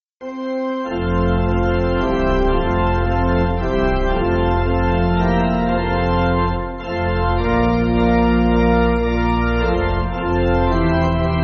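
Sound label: Pipe Organ, Backing Files for Churches; Philip Phillips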